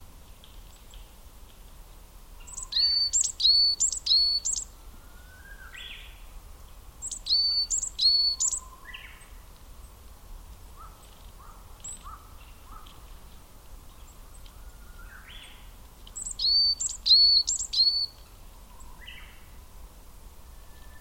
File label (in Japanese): yamagara; akasaka